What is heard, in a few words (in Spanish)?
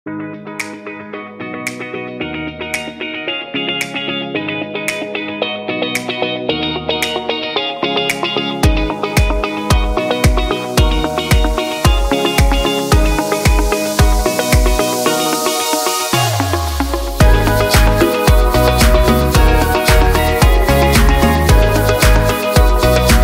Música De Fondo Para Videos Música Alegre Para Videos